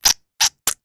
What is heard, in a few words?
human puss squeeze squirt